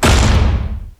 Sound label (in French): claquement porte